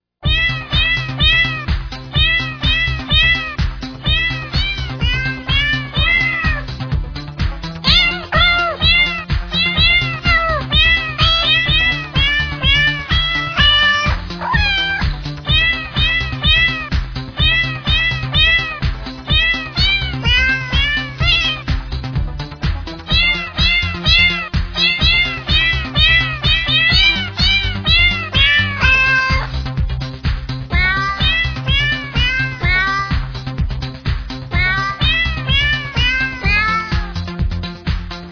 Dance Medley

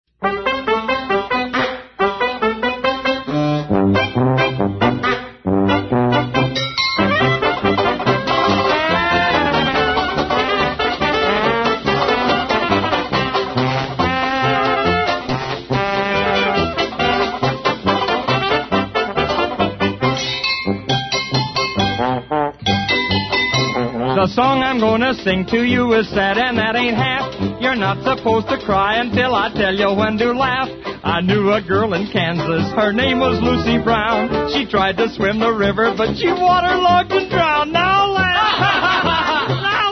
Now Laugh

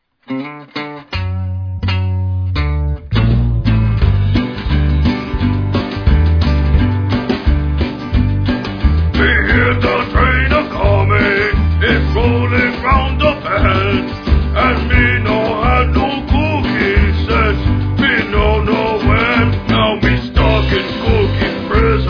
Blues

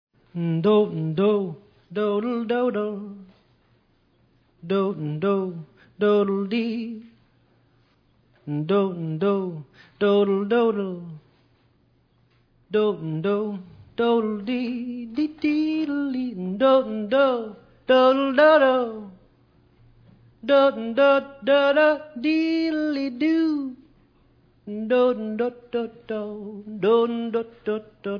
Instrumental

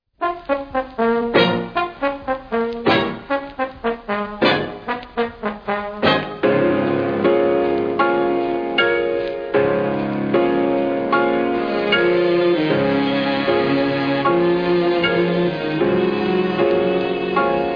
Orchestra, Piano Concerto In B Flat

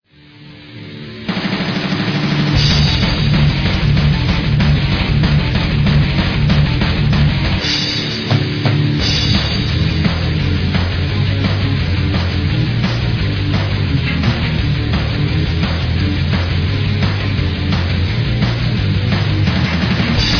Instrumental

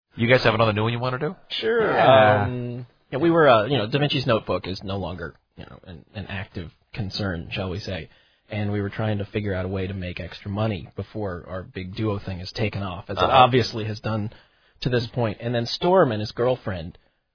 Rejected Commercial Jingles; Fresh Step Kitty Litter

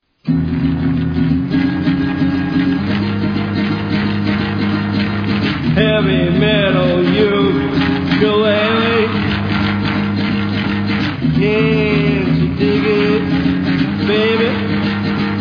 Heavy Metal Ukelele

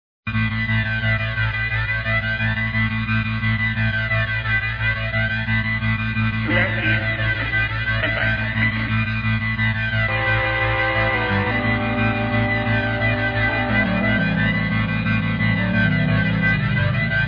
Moog

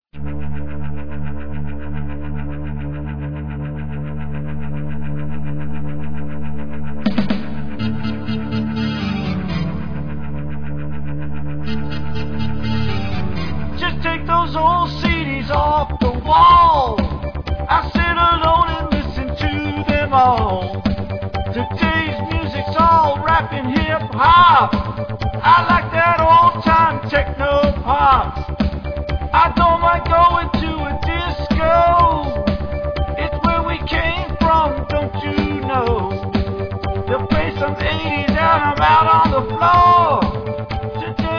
Old Time Techno Pop

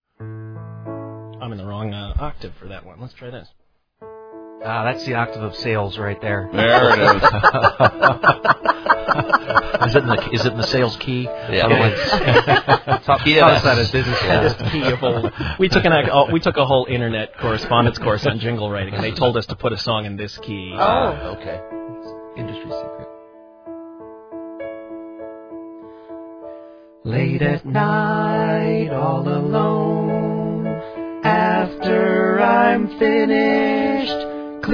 Rejected Commercial Jingles; Kleenex

